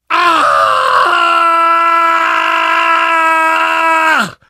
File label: fall, survivor voice; source engine; left 4 dead 2; namvet